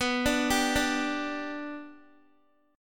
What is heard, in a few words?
B-Minor Sharp 5th, Guitar; arpeggiated